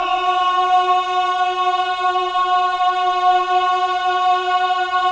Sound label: men "ah", choir of ahs; Roland - LA Composer vol. 1